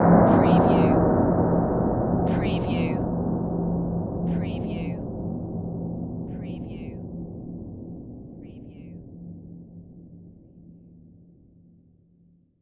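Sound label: Production Element Hit Gong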